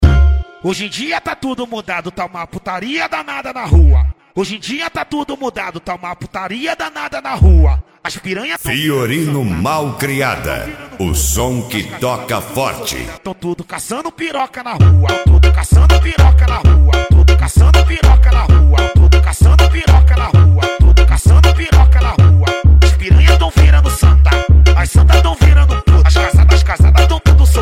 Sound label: mega funk bass